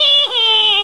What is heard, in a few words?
Multiplier Got Half; Barrack Unity